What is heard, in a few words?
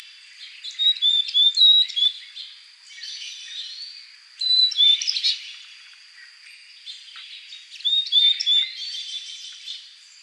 Carolina Chickadee Poecile carolinensis